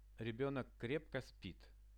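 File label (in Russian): rebenok krepko spit, child sleeps soundly; спать; Ребёнок крепко спит.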